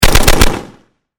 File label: Machine Gun Fire Design; Warfare; Machine Guns